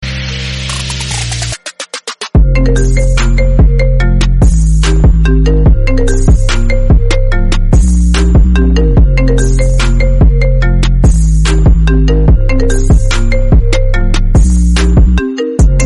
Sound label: I Phone Trap Remix